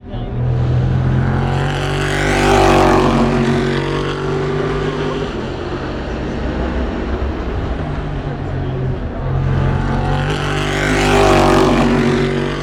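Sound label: corvette tertre rouge qualifying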